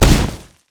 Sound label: basicattack ohs; LoL; galio